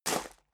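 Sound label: step dress boots gravel medium single left, footsteps; schritte; menschen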